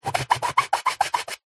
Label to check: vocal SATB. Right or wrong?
Wrong